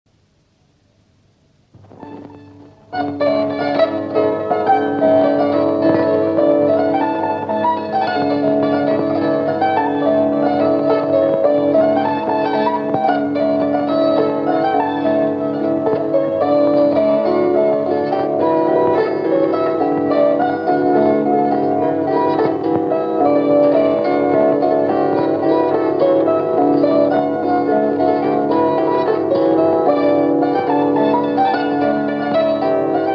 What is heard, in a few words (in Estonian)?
Labajalavalts, kannel, folk; Vandra